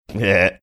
cute laugh

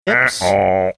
doing his version of thumbs down sound effect

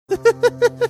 cute laugh

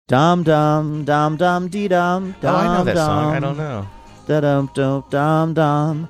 singing melody dancehall era song

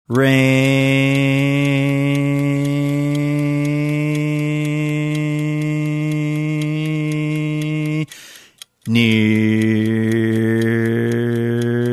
car engine revving; rainier beer

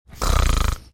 making snoring sound

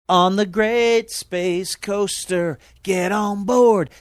singing; great space coaster

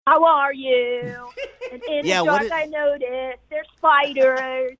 singing manner of singer

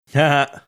chuckling

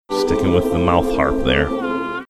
mouth harp

